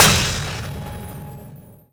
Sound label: Short Instant Bang Cinematic